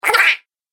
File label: Horror Gremlin, monster